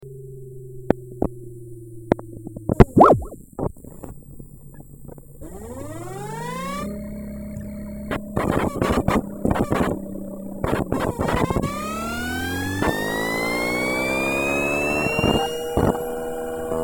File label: Dell Inspiron 1800 boot loop